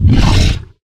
flesh attack, monsters

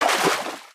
water, human step

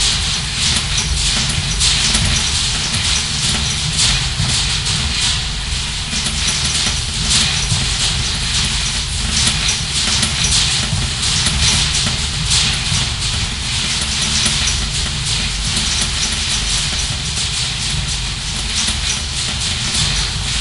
rain on roof

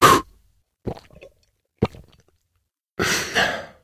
drink

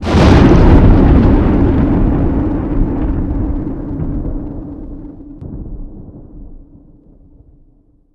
thunder; anomaly

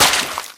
impact weapon large water; collide; large-weapon

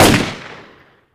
sig550 shot

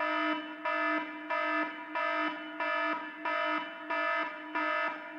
warning; ambient

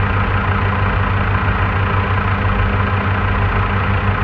marsh generator; ambient

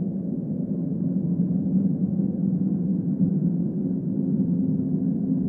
dark wind, ambient

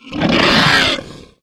flesh pain, monsters

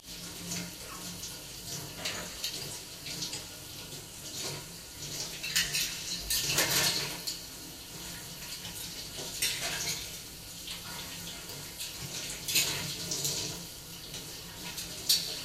kitchen, bar ambient

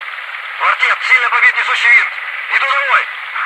damage, helicopter

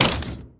impact wpn small metal

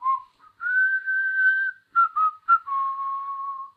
whistle, stalker humming

